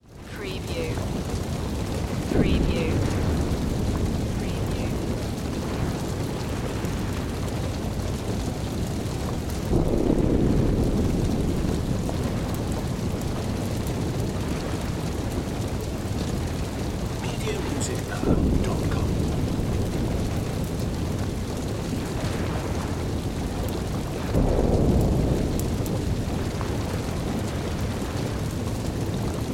Warfare WWII World War Two Battlefield City Fire Distant Gun Shots; lynne-publishing